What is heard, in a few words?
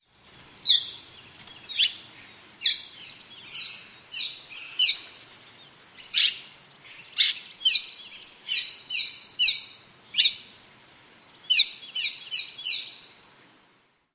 calls